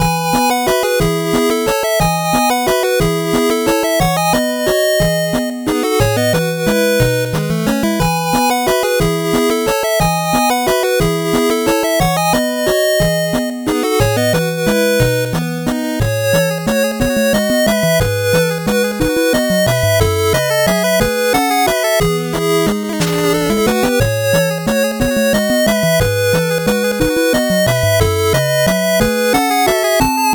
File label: 8bit bgm normal